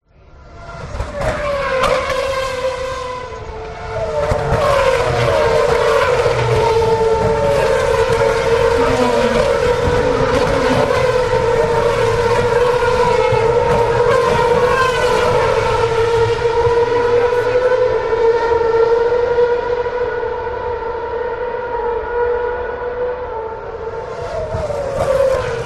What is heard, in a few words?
sport motor racing formula one cars pass close